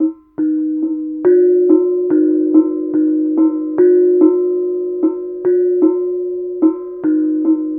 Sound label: KENONG; Spectrasonics; Heart of Asia; KENONG PH